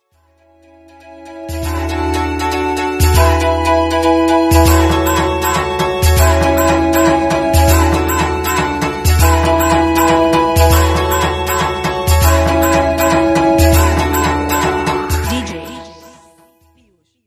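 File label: Oppo K3, ringtone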